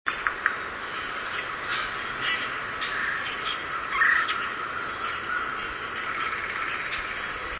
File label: red-bellied and drumming, Red-Bellied Woodpecker, "kerr-kerr-kerr" looking for insects clinging to a dead tree, tree frogs in background; "Gurgle" call and drumming, Mariner's Court, Centerport, NY; birdsongs